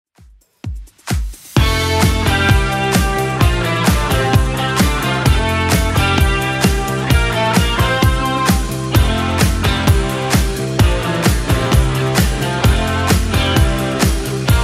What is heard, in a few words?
80s Club Edit 130bpm; remixplanet